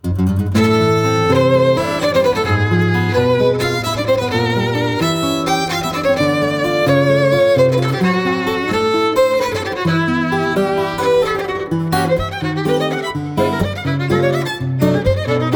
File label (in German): Geiger, Nightclub